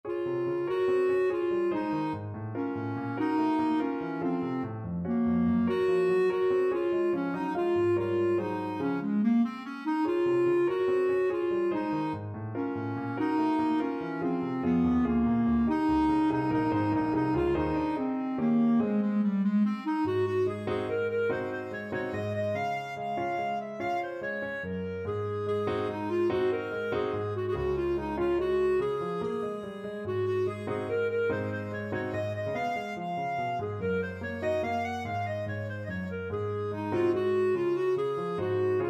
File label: la paila, clarinet